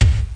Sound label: bass drum